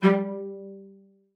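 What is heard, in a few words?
cello spiccato G3, cello; Xsample VOL-5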